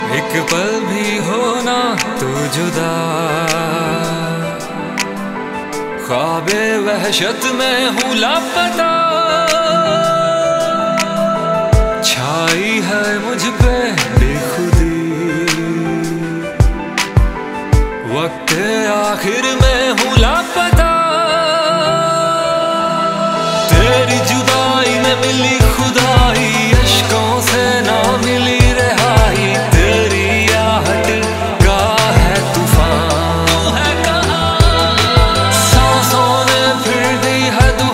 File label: Sad Hindi Song Ringtone